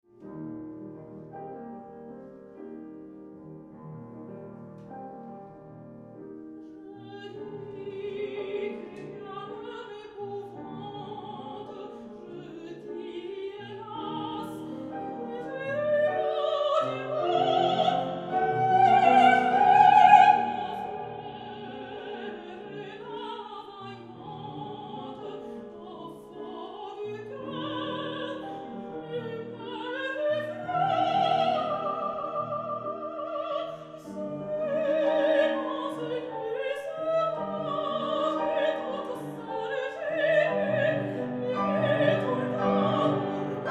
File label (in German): Arie der Micaela, Sopran; Carmen; Meisterkurs